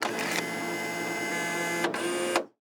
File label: vending machine; PremiumBeat SFX